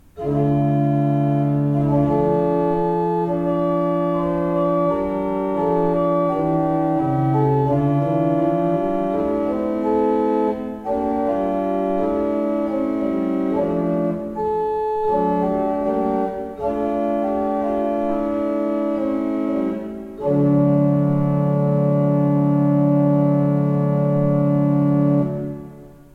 Breil, gebrauchtorgel

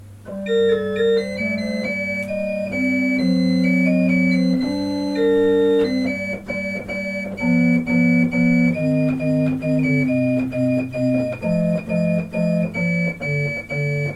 Hausorgel II P

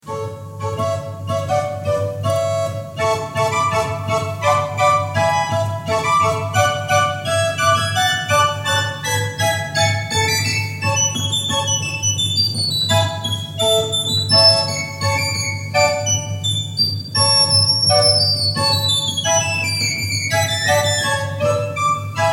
Oehms 6 II P, orgel

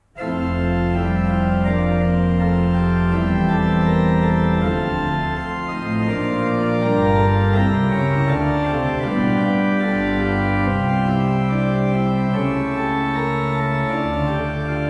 Fleiter 19 II P, orgel

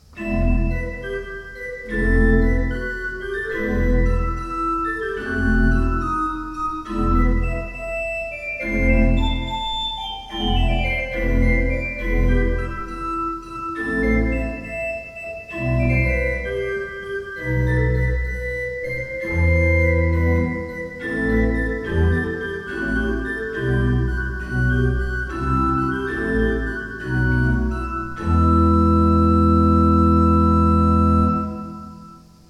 Walcker, gebrauchtorgel

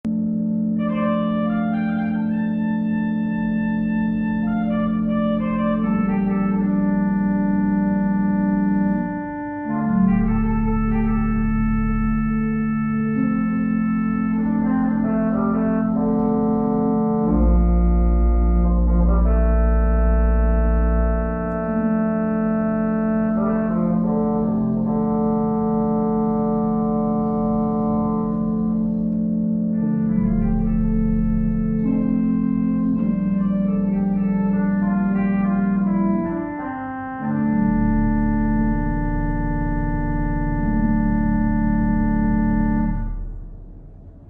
Orgel, pfeifenorgeln; Benson

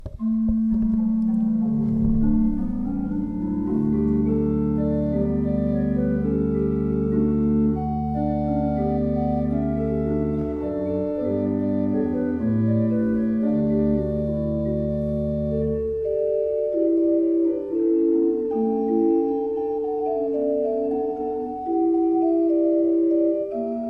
Fuehrer, gebrauchtorgel

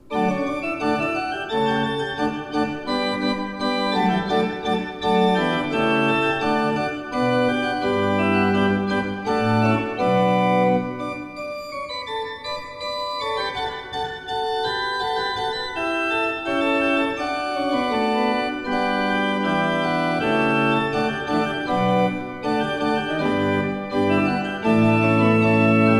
Vermeulen 5 I P, orgel

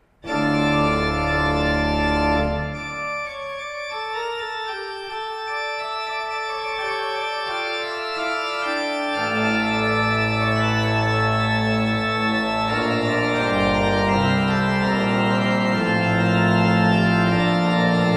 Fleiter 19 II P, orgel

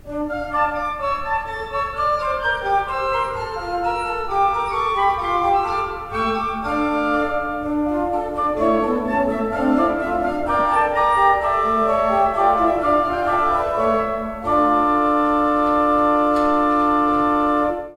Euler II P, Orgel

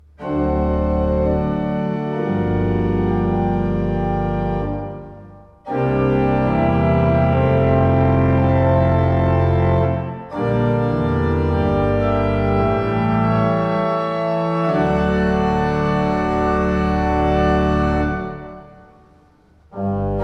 Beckerath, gebrauchtorgel

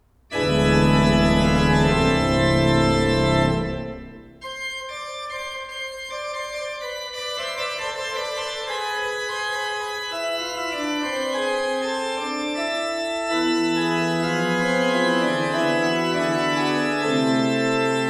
Bosch Raupach, gebrauchtorgel

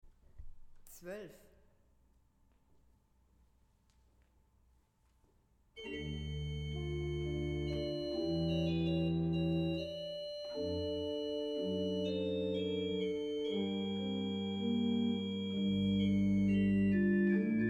Weigle, gebrauchtorgel

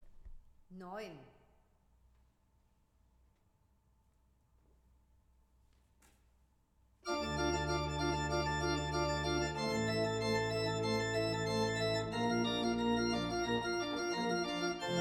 Weigle, gebrauchtorgel

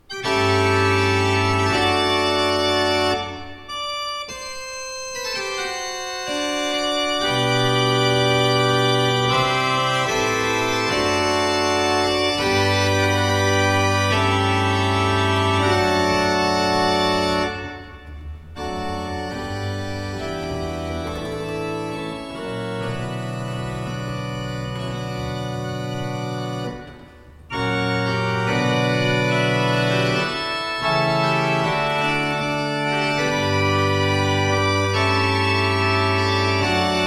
Peter, gebrauchtorgel

